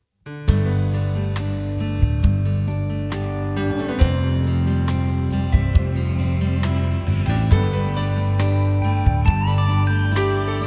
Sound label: Mix Fairchild